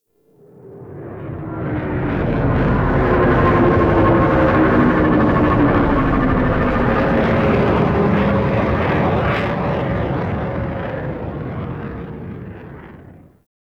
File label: Ship Flyby, Dropships; TheTeacher